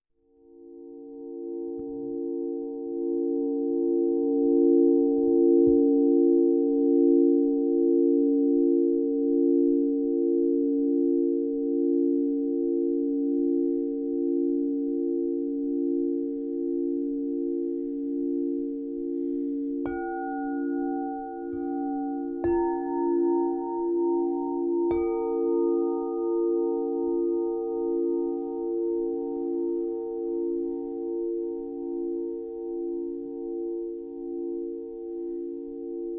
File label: Harmonised Healing Set, crystal singing bowls